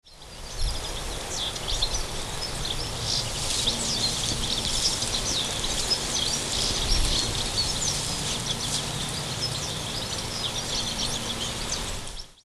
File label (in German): mehlschwalbe